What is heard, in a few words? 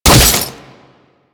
Impact B; Condemn; Warframe; Priest